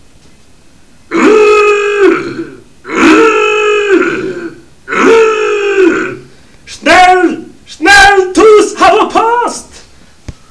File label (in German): uboot alert